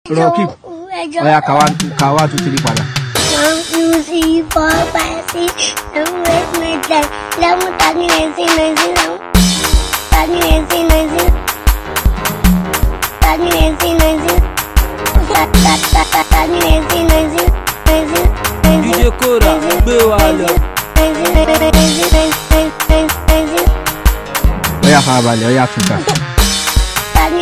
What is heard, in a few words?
Beat